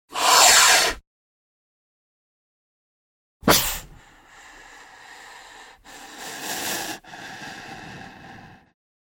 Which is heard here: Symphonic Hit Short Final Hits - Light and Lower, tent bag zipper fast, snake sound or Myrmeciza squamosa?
snake sound